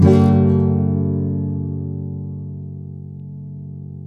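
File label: nylon guitar down minor G#, flam chords; Spectrasonics; Hans Zimmer Guitars VOL-2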